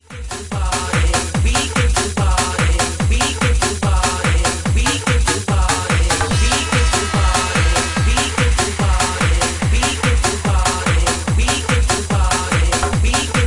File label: Bouncy Mix